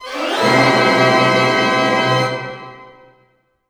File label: GLISS F# MAJ; Sound On Sound - Peter Siedleczek's Orchestra